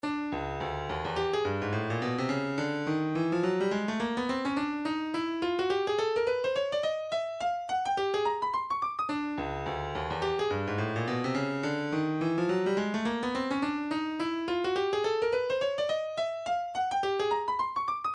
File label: 109 room, midi